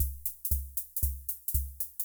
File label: Kay R-12 Rhythmer Loop, Drum Machines; Kay R-12 Rhythmer Sample Pack; 300 Drum Machines